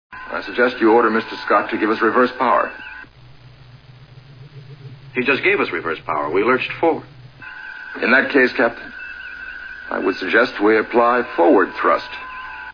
thrust

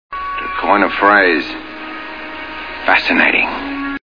coin

